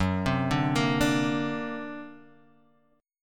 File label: D Augmented 7th Gb, guitar